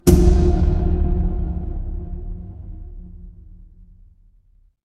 magic scifi shield

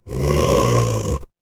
horror growl

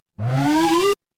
retro game jump

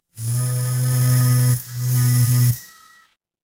office phone vibrate

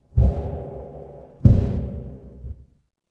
transition braam

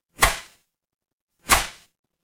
paper fabric cloth whip

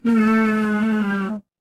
feedback error

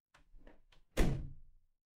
door close

